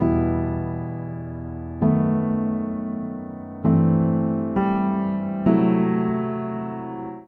Progression i-V7-VI-iv E Minor 132 bpm, Upright Piano; MIDI Clips; Cold Hearted; Upright Piano by Spitfire Audio; Ableton; Factory Packs